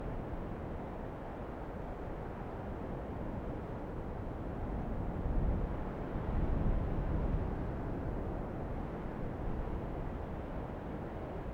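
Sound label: desert loop light wind gusts; ambient; atmosphere; Counter Strike Model Pack; CS:GO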